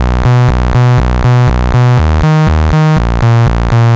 electrowerkz B 121, synth loop; Zero-G; Soundclash; Nu Dance Electro

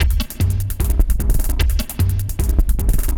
LOOP, 150; Masterbits - Tekkno Trax; D LOOPS

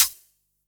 Closed HH

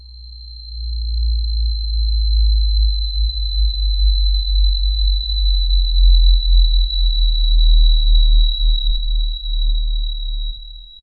DRONE HIGH; Zero-G Ambient 1; WEIGHTLESS

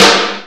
SNARE E3; Greytsounds VOL-1; SDX SNARES

